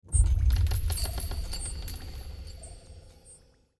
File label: CLOSED DOOR BUBBLE